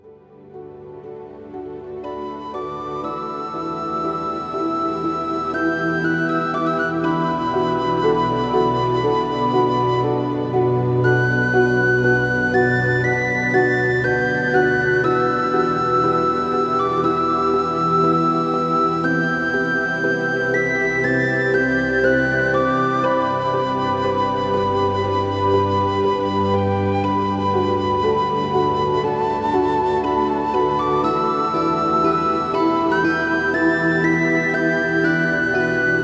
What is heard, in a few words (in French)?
musique